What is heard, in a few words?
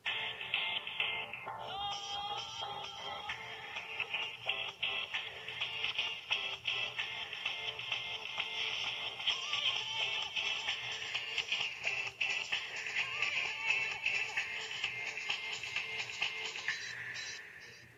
nokia 2610 ringtone; higher